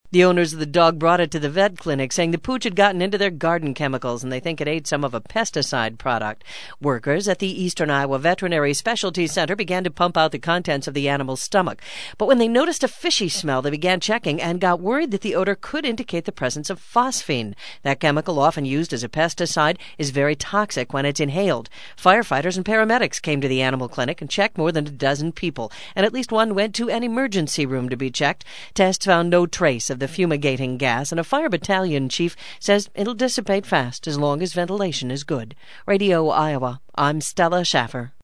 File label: burp